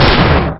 blast, weapons; quake2

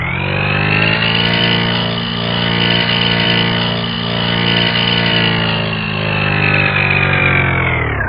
rocket chargeup, weapons; quake2

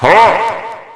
pain; quake2; Hueteotl

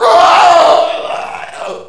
death; quake2; mnhcoop

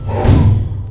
step, tank; quake2; coop

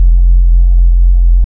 ion hum, weapons; quake2; coop

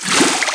watr out, player male; quake2; mnhcoop

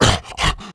pain; quake2; redux; quakeguy

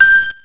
pain; quake2; tourney; battlebrowzer

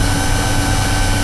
idle, acannon; quake2; mnhcoop

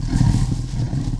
stone; quake2; mnhcoop

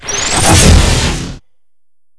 gladiator attack; quake2; coop; bfgladiator

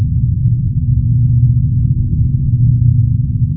rumble; quake2; coop; slaughter

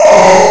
pain; quake2; coop

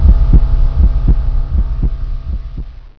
stasis stop; quake2; mnhcoop

